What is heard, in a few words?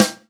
SD, Dance Mega Drums; Best Service